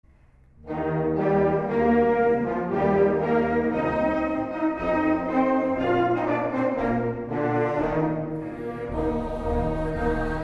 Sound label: chorus